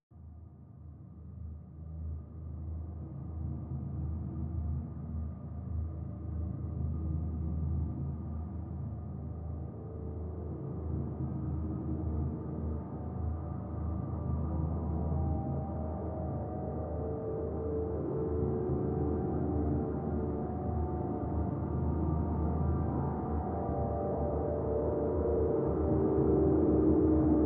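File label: drone D; Drn Bleeding Out D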